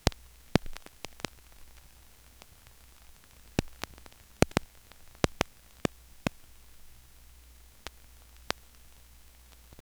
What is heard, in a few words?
low level broadband hiss t; noise